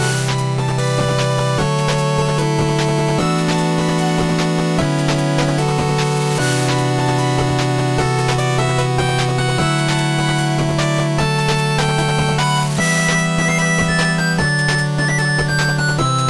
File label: 8Bit; PMD2